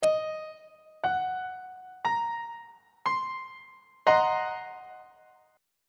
b sharp half diminished 7th 1st inversion on treble clef